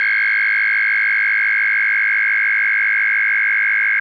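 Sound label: DX100 A#0; rocket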